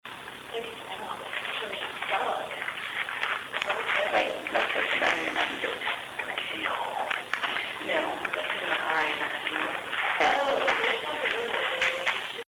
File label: projector room; auditorium seminar